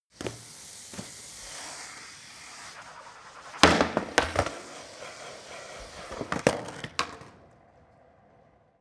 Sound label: skateboard indoors slide drop; sport freizeit